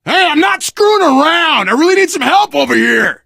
ledge hang, biker; left4dead; survivor; voice; Source engine

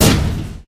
metal barrel impact hard; Source Engine; video game; physics

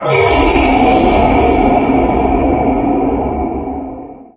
male scream; Source Engine; tf

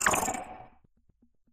editor buttons collapse; Source Engine; Portal 2

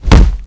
body medium impact hard; npc; source engine; ep2; ministrider

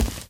run tile, footsteps

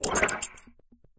editor turret explo; Source Engine; Portal 2

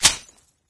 clamp down, weapons; source engine; dod

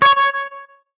hitsound menu note

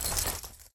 heavy equipment light; taunt; player; yeti standee; source engine; tf

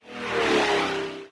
sports exit windows; windows 9x; sound schemes

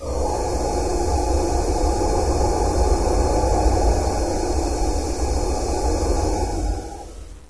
metallic, ambient atmosphere; source engine; hl2; video games

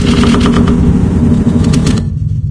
cabin wall, soundscape; Source Engine; video game

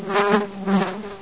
bee, ambience

